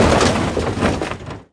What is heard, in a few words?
Ground Crash; SuperBike2001